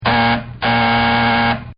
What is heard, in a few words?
DOOR BUZZER; Door Buzzer 2; Miscellaneous Door Sound Effects; Doors General